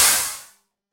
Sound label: poison gas; Traps & Hazards; freepixel